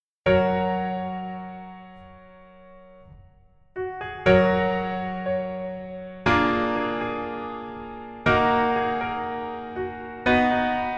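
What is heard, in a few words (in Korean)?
Piano version 멜로디 MR 여자키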